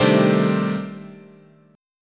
C-Minor 6th Add 9th, chord, guitar

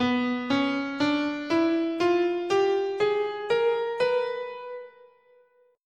B Diminished Whole Half, scale

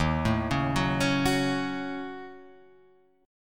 Eb Minor 7th Flat 5th, chord; arpeggiated

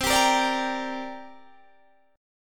C 7th Suspended 2nd C down Guitar Open D

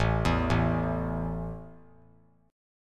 A 5th A down, chord; arpeggiated

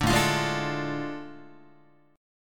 A Major 7th Suspended 4th Sharp 5th, down, Guitar; AM7sus4#5; strummed; JGuitar

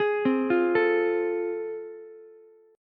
A Minor Major 7th Sharp 5th, Ukulele; arpeggiated; AmM7#5; JGuitar

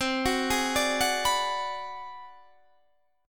Ab 7th Sharp 9th Flat 5th, chord

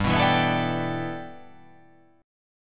G 6th Add 9th G, chord

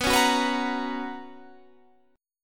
B Major 7th Suspended 2nd Guitar Standard, chord; BM7sus2; strummed; JGuitar

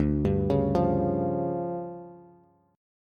D Minor Major 7th Double Flat 5th, D, Bass; DmM7bb5; arpeggiated; JGuitar

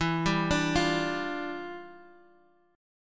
E Minor 7th Flat 9th

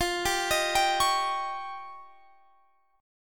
F 7th Suspended 2nd Sharp 5th, Guitar, Standard; F7sus2#5; arpeggiated; JGuitar

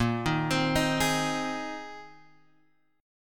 A sharp 9th Suspended 4th A sharp; A#9sus4 Chord {6 6 X 5 4 4} arpeggiated

